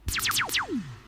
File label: shoot sound; BoatRush; Racing Games